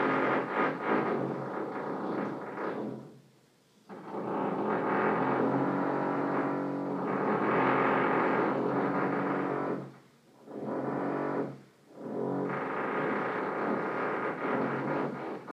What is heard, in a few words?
Noise